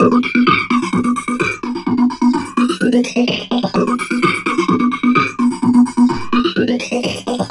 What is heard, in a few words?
BONE TALK, TALK BOX; Spectrasonic Distorted Reality 1